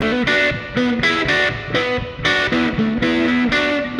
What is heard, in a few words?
Guitar wet